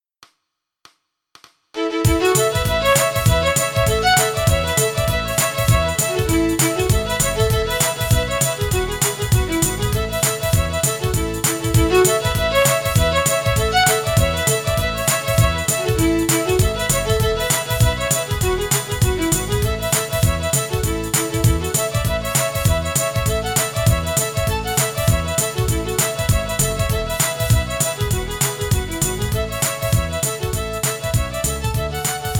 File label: Bluegrass Cajun fiddle A